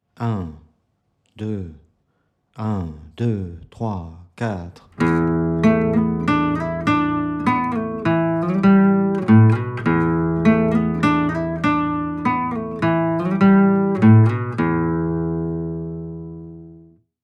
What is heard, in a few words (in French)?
exercice 100bpm